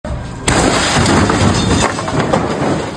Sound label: car crash